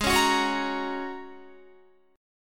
Ab 7th Suspended 2nd Suspended 4th, Guitar, 4E,4A,5D,5G,5C,6F; strummed